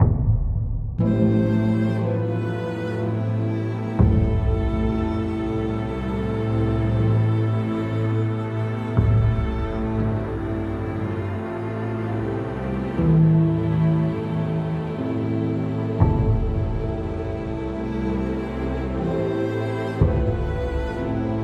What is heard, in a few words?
Loop Serious